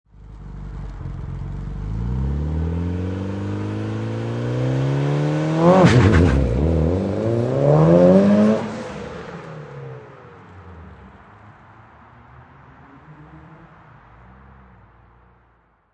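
Mitsubishi Evolution Exhaust Takeoff, Auto, Power Car, High Performance Car Sound; Exhaust perspective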